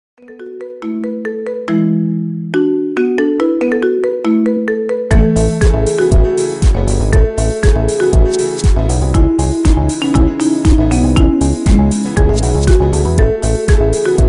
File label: marimba ringtone remix